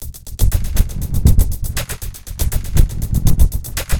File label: Drama Drums (120BPM); cinematic drama; Loops; musicradar